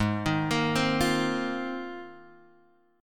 Ab 6th Add 9th Ab, Guitar, Open G

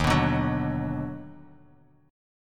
D sharp Minor Major 7th Sharp 5th D sharp down; D#mM7#5 strummed

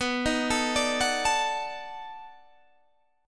B 7th Sharp 9th, down, Guitar, DADGAD

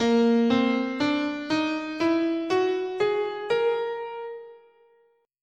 Bb Major Locrian, scale

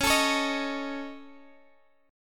A Major Flat 5th C sharp

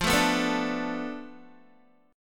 F 6th Flat 5th F Guitar; strummed

